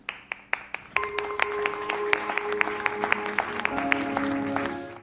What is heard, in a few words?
clap